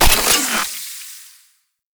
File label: Shotgun Empty Reload; Pax Duviricus